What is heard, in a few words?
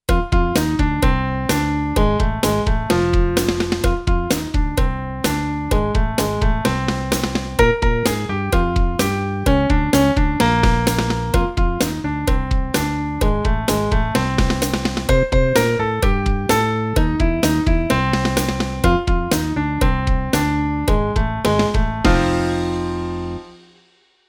Back Beat Shuffle